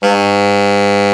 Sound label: TENOR, Tenor Sax; Tenor Sax 1